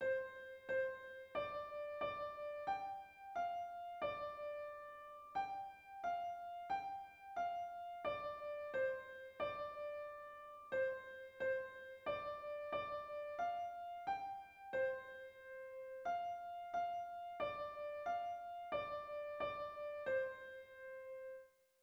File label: Melody